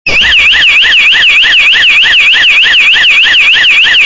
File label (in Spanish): MODULADO; SLIM